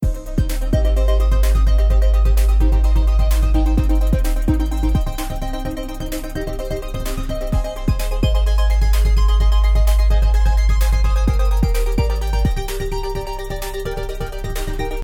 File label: Music; SMU Anime